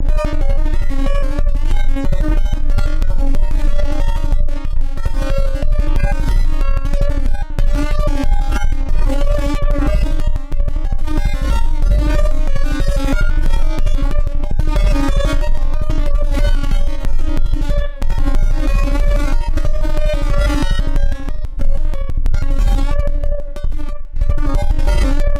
kick explorations

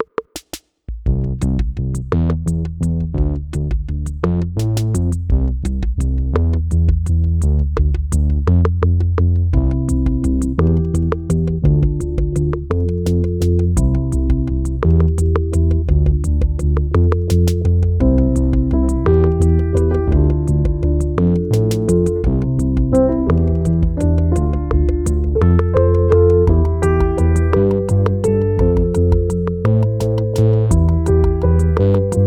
Buzzy Minuet

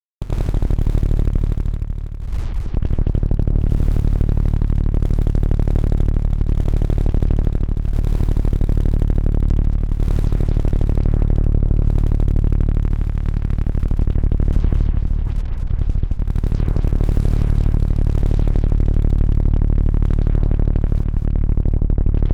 kick explorations

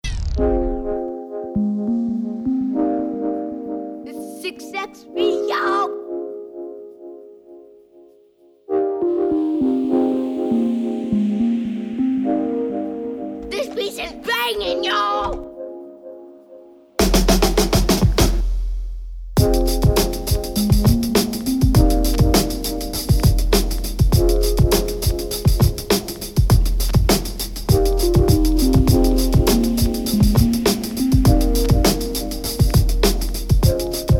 Instrumental

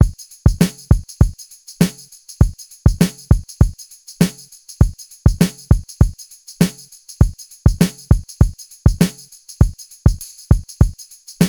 Breaks, 100bpm; Cut-up Breaks; Kizes Cut-up Breaks

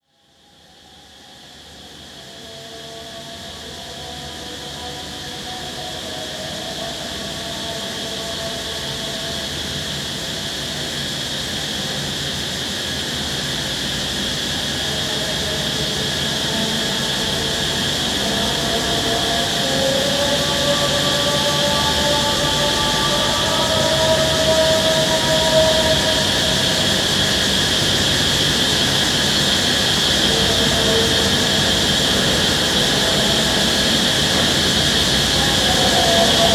Field recording from Thailand

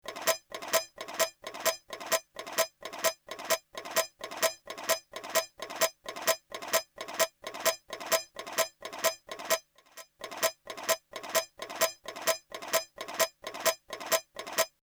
old drum percu, 130

